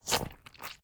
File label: blood, fluids